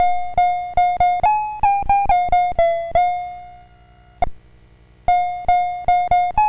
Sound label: MOS6581R4, GAMES; Trail West BASIC